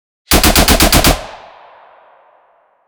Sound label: firearms